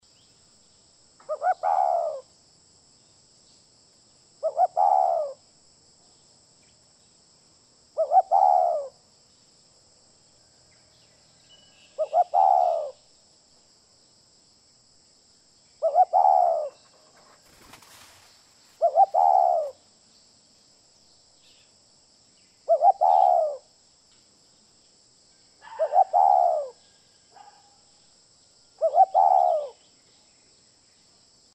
珠頸斑鳩; 斑頸鳩; 鳩鴿科/留鳥

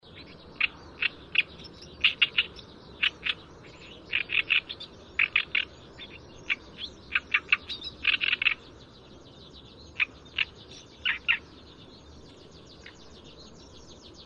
東方大葦鶯; 葦鶯科/冬候鳥

